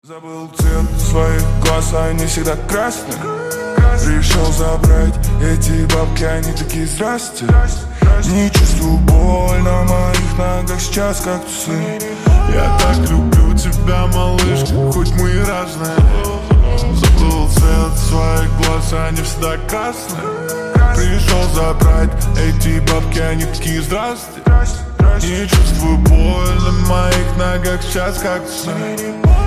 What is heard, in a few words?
slowed reverb